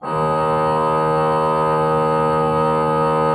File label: D2, Cathedral Organ, HOLZPRINZIP; Propeller Island